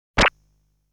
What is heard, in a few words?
fruit basket fall sound; legend of hero tonma